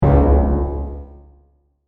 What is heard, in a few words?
Synthetic Sci Fi Impact; Miscellaneous Impact Sound Effects; science fiction sound effect